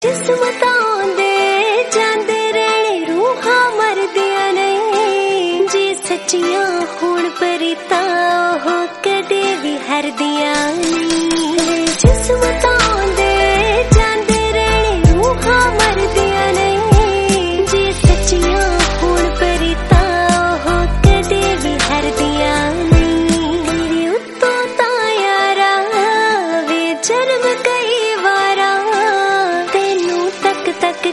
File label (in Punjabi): punjabi; title song